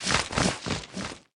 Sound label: Wing Flap Tiny Underlay Attack